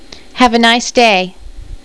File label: FEMALE H; PEOPLE